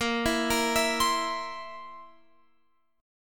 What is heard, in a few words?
A sharp Suspended 2nd Flat 5th A sharp, Guitar Open F; A#sus2b5 arpeggiated; x,17,16,17,19,19